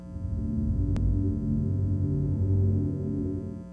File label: bell